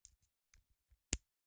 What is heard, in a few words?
Clings, Clothes